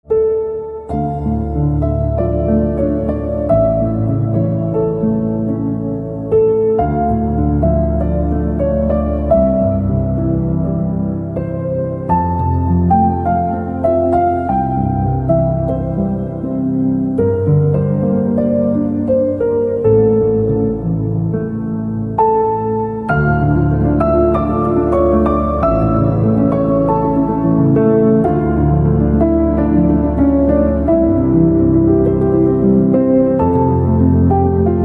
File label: Piano Silent